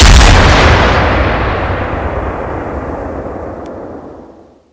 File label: pkm fire